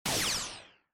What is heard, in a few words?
Motion Speed Lines Short; モーション スピード線; 短